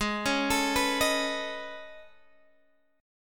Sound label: A Major Sharp 11th, G sharp